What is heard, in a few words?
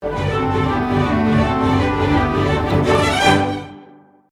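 Trepak 3 Layers, Melody harmonized in thirds in the violins / • Layer 2: Melody simplified to quarter notes in the woodwinds / • Layer 3: Rhythmic accent in the low strings and bassoons.; Rule of Three; The Nutcracker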